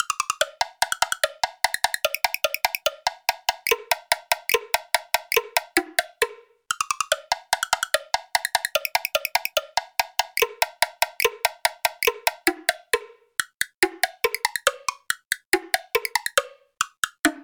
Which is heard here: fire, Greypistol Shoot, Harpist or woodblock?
woodblock